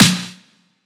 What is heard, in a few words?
snare smack; Drum One Shots; Slate; SD MIDNIGHT Sample Pack